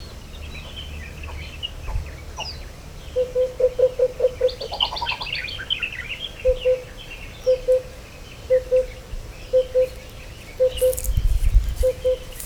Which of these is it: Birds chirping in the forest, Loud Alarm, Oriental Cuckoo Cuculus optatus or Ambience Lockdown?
Oriental Cuckoo Cuculus optatus